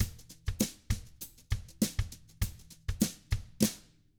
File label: FUNKY, Drums